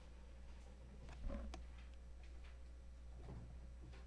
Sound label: horn